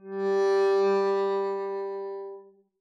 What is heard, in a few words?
arco g3 mf up, double bass